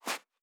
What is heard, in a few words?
Footstep Sand Walking; Ratel; Toria; Character Sounds